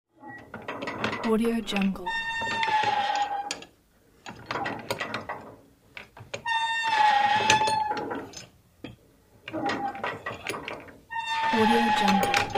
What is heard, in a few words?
the metal boat swaying with a creak; rolstudio